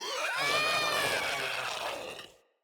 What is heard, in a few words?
Infested Runner Explosion Warning